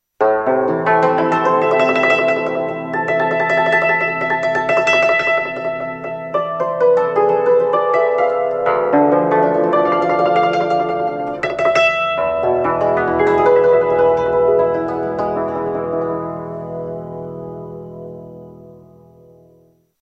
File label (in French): instruments cymbalum